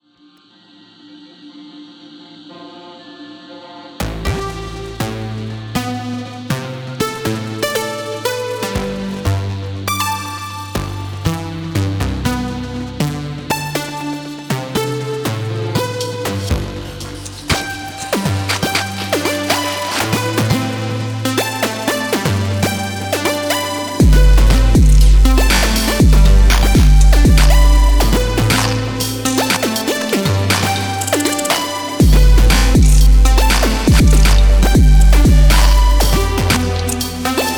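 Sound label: intelligent dance music; idm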